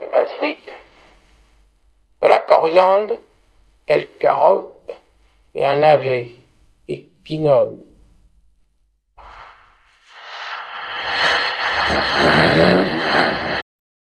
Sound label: jingle